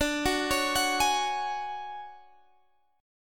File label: D Major 7th Flat 5th, chord